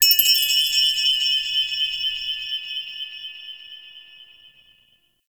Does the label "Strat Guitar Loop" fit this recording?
No